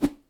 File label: zip; jocly; mana